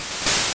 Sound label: Water Gun; sfx; attack moves; pokemon; gen 2; gsc